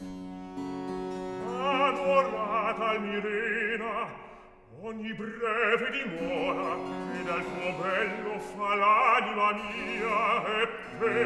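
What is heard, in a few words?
Recitativo, Handel opera; Act 2; Adorata Almirena; Handel - Rinaldo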